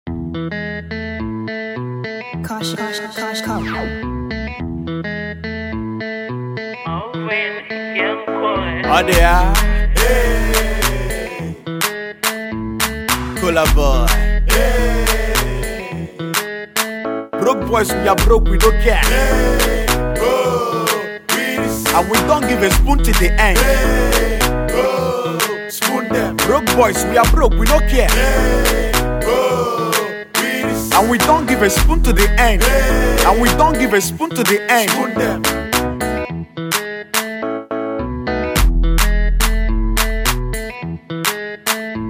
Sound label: Broke Boy Instrumental